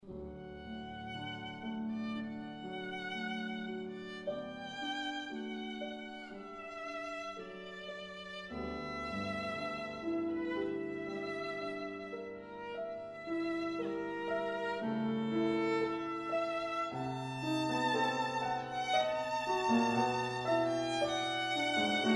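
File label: violin sonata; gernsheim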